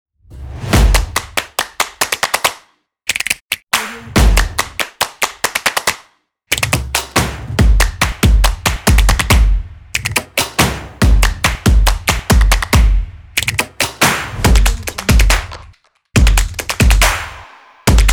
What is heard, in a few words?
percussion